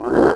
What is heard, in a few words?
bear hit; DAoC